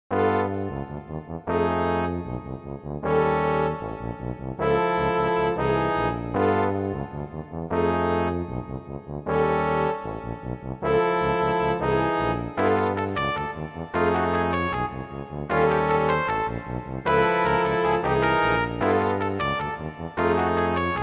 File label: brass quintett